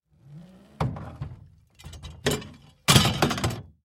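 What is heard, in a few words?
anchor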